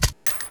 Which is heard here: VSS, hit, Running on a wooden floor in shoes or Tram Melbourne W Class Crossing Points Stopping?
VSS